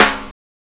Snare, Drum and Bass

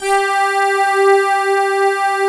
SAD ACCRD; TRISTESSE

